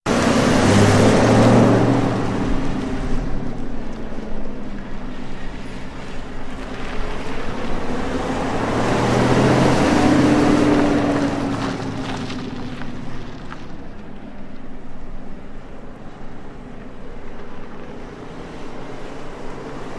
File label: Mustang By x4 Medium With Turn On Gravel